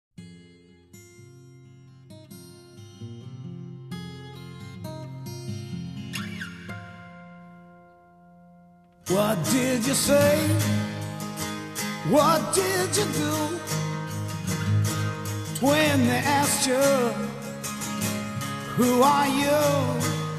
Rooster Crow

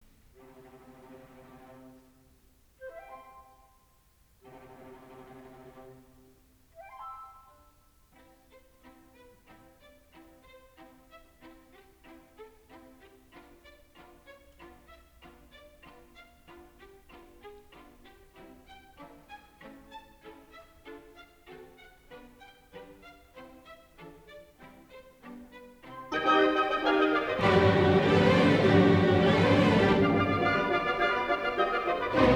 Orchestra Thunderstorm

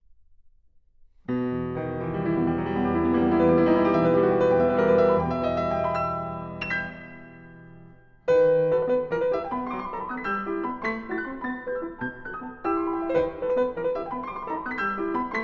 Waltz in E Minor

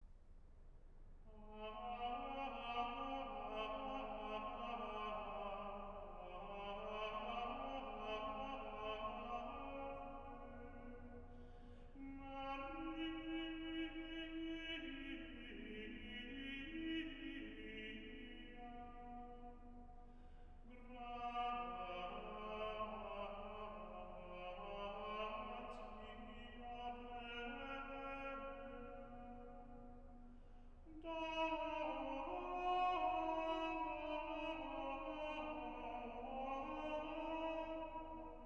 Cathedral Choir Ave Maria

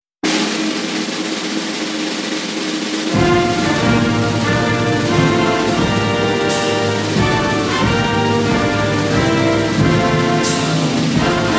Orchestra National Anthem USA

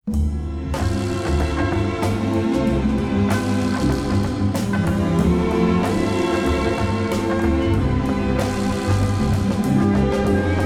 Strings Orchestra Boca Chica

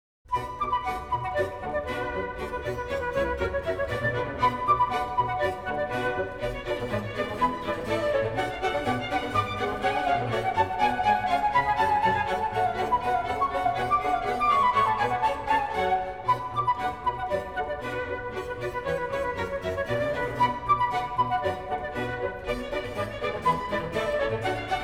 Suite for Orchestra in B Minor for Flute

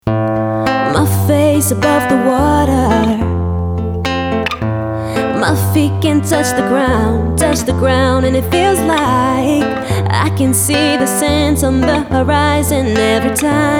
acoustic cover

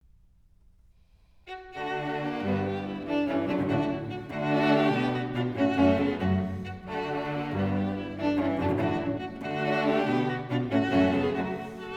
String Quartet No 2 Allegro giocoso

